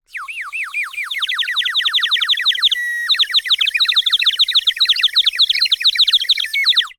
Bird whistle, clay, painted, water, airy, wispy, flurry, buildup, frantic, frenzy, strong, water plops; Eiravaein Works; Vaeyan IV